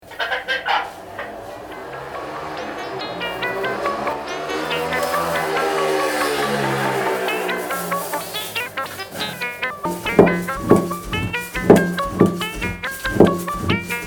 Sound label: jingle